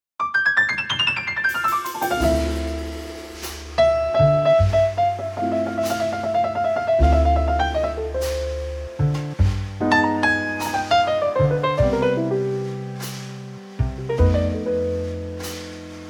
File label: Trio; key - C - vocal range - F# to A